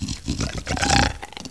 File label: Worm